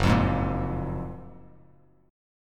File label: Gb Major 9th, down, Bass Standard; GbM9; strummed; JGuitar